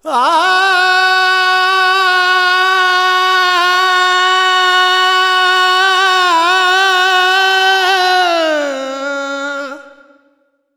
Expression, Vocals Male World Pop; Sonic Foundry (Sony Creative Software)

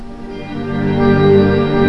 FAIRLITE, OLD SYNTHS; Rubber Chicken Software Sample Bank

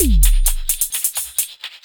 Percussive, Drums & Percussion, Beat Components

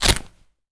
Foley Mid, Drum Bits, Sweeteners

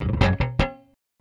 Rickenbacker Bass; Airwindows; Rick-Xtras

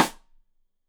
Side Stick Stereo; Deeply Sampled Drums

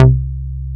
SH101; OLD ROLNDS

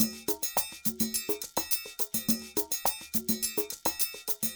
Ensemble, Latin Percussion

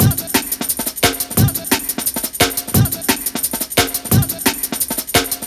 A, 175, classic dnb breaks

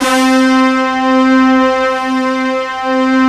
HRN PAD C1, D70 SYNTH; East West; Global Sounds

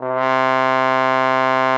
C3, TROMBONE; Xsample VOL-3

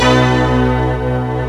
HIT B2; East West - Global Sounds; PPGWAVE22

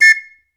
2 NOTE WH, World Whistles

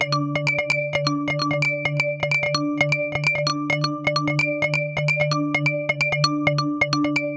130 D Seq Glass Tones; Sequences; Zero-G; Future Wave

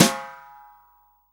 SD, REAL DRUMS, SWING KIT

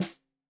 Snare 65 Reg Stereo, Deeply Sampled Drums

